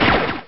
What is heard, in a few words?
magma shot